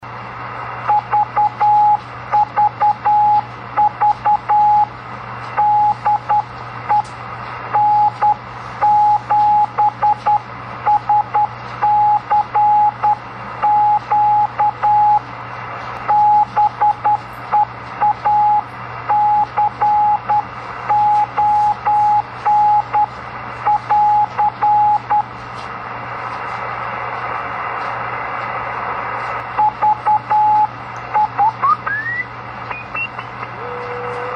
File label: Key Clicks